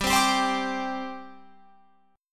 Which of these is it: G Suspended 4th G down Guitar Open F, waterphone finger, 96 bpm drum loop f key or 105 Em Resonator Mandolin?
G Suspended 4th G down Guitar Open F